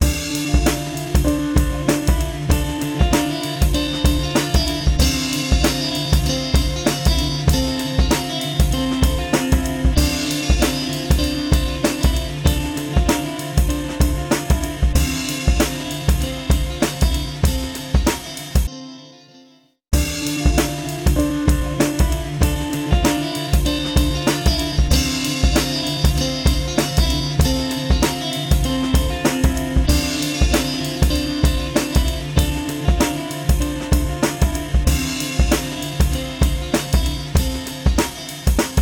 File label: Loop; Rebel Scream